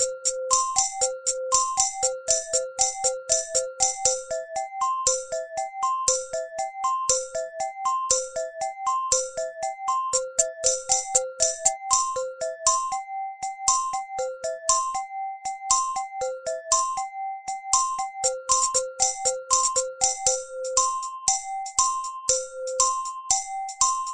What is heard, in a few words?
TAMB BELLS